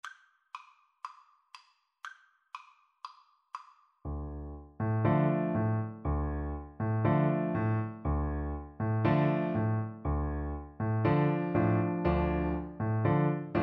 Piano Duet

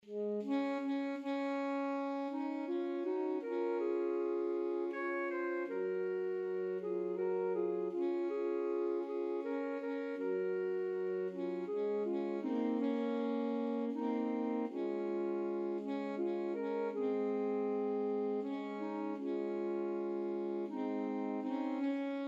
Shenandoah, alto sax quartet

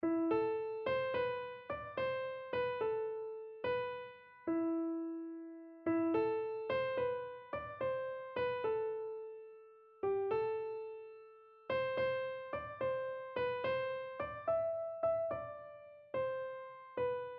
gabriels message, keyboard

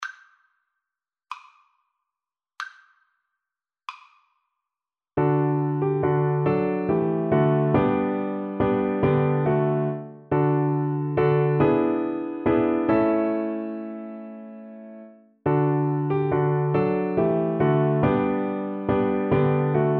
softly and tenderly viola

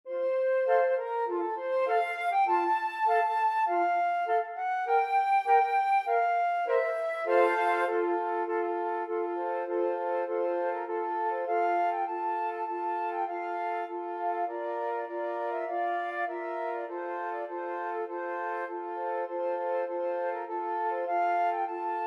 flute quartet

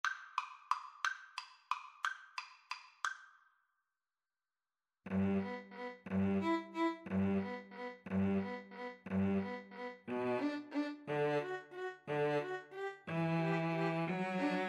serenade, 2 Violins Cello; tchaik

